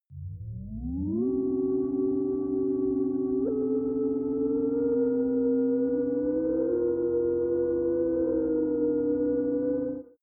chiming synthetic wind

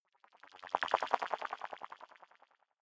sci-fi subterranean light up high

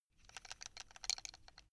buckle rattle quick

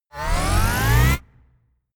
wind up

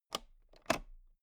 toolbox latch open loose clatter

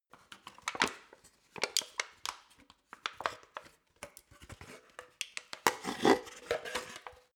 plastic cup handling slow

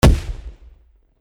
m80 fireworks explosive

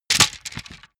wood sticks small break deep

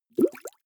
bubbles soft

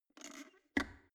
plastic water boiler close lid

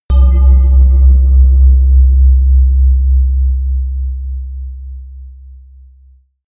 melodic bass bell

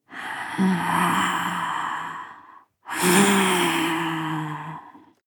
breath female moaning breath angry

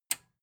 metal button tiny release disable

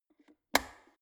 plastic water boiler open lid fast

